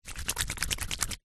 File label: human hands wet rub together; zapsplat